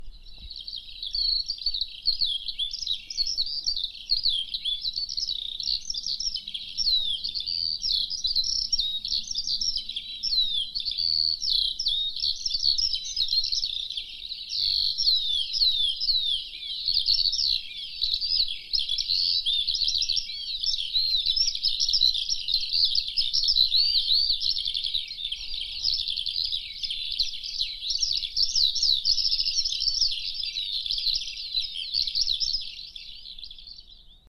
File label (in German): Lerche